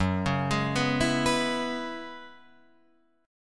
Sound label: Gb 9th Suspended 4th, Guitar, Open F; Gb9sus4; arpeggiated; JGuitar